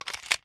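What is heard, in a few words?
weapon pistol 10mm reload in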